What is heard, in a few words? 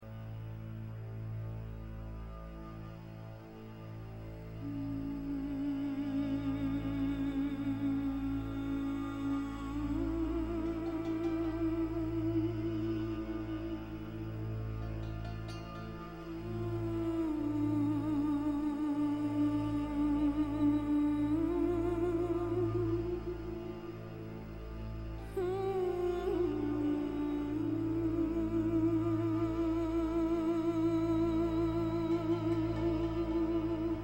instrumental; Gladiator; آهنگ های فیلم گلادیاتور